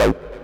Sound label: 303 Acid A; Vengeance Samples